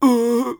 hit; Escape from Tarkov; scav5

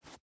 footstep boots sand jump start; Rust

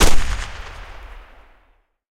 mi35m 23mm gun close shot; guns; tanks; War Thunder

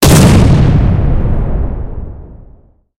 tanks explosion; War Thunder

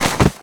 crawl bodyfall dirt; Modern Warfare

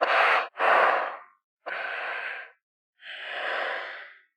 Aircrafts Cockpits G Breath End; DCS

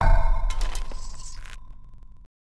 Build Longbowman; Civ4 warlords

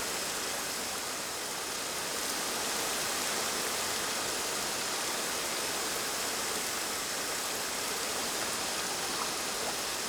ambience field night calm rainy; Rust

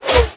sniper whizzby

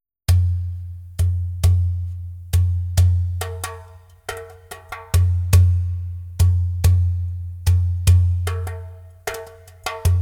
Whirling Dervish Rhythm; Civ5; Middle East Africa; War

